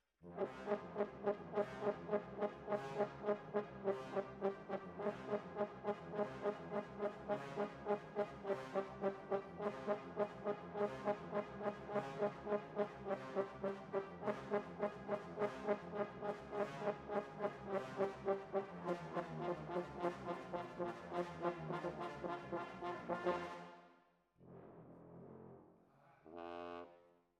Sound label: Horns